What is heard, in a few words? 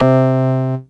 BASS GROWL C4, Yamaha TX-81z